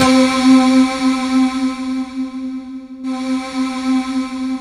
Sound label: C4, SynthLine; 90 s Sample CDs; NiceBeats; SynthLine VOL-2